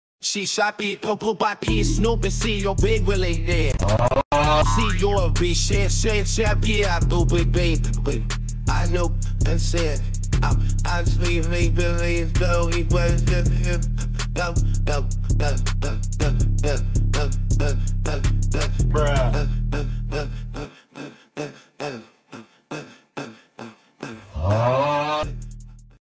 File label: beat